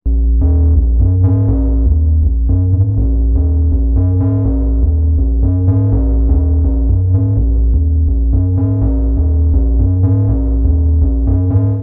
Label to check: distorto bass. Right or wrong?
Right